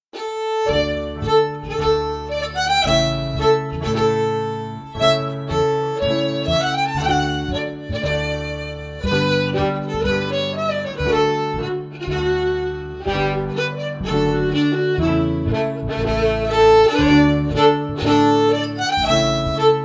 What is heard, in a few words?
FIDDLE PIANO